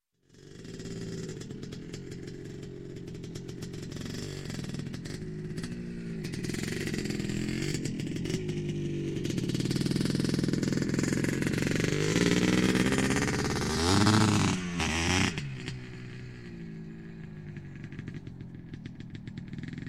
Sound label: Motorcycle, Dirt Bike Riding Around On Trails; Sound Ideas; Series 2000